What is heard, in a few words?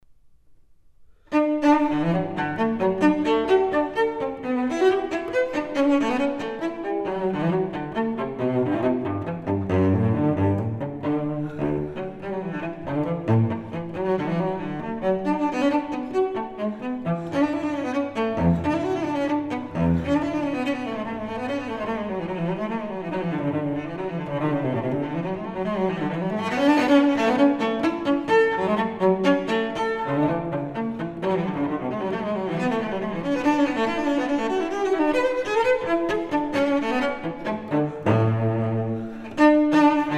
Suite for solo cello No. 6 in D m; Bach; Cello Suites